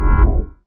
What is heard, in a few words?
debuff apply